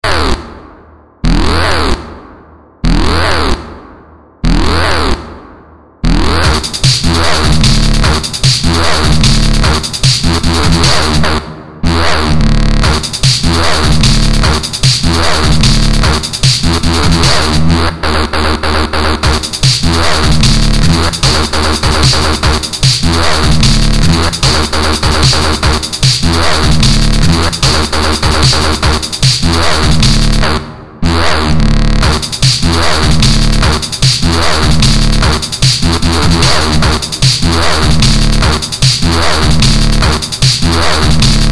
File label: drum and bass